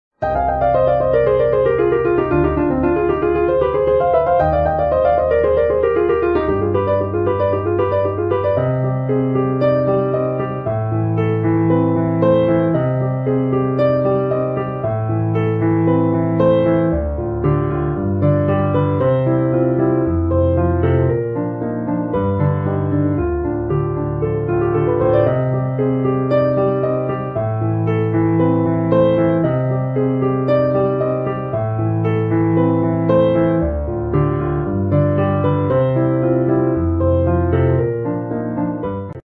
evergreen bgm, best love background music, love ringtone